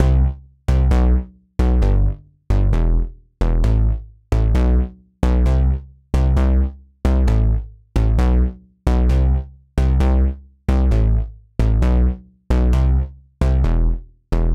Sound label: Poly Bass